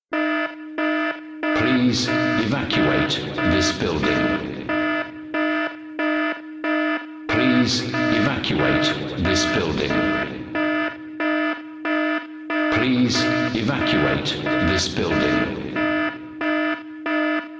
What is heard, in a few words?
alarm evacuate